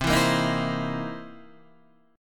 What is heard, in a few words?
C 7th Sharp 9th, guitar; C7#9 Chord {8 7 8 8 5 8} strummed